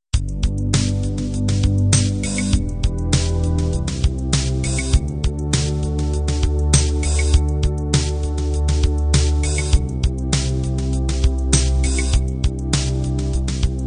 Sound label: groove ballad